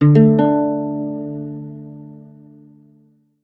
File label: Selection Ukelele chord; Sound Ex Machina